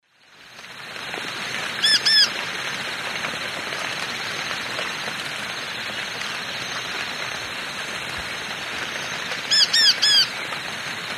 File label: Myrmotherula longipennis zimmeri, distress call male; S. America; Eastern Lowlands